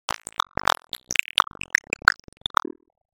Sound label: Futuristic text generation short